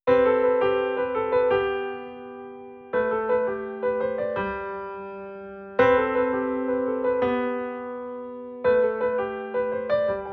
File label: Piano ver.